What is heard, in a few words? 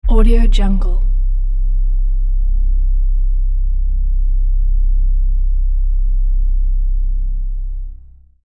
Synthesizer Bass Drone